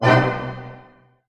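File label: F# minor pianissimo, orchestra hits; Sound On Sound - Peter Siedleczek's Orchestra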